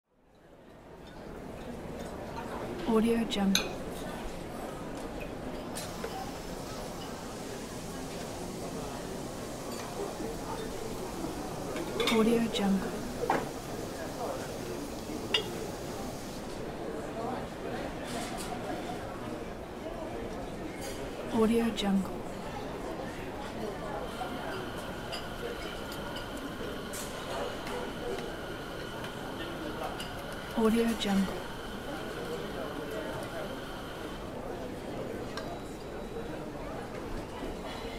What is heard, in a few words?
coffee shop ambience